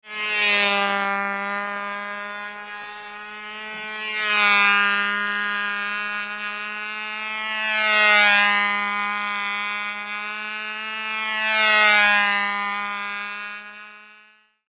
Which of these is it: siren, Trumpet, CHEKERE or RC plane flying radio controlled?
RC plane flying radio controlled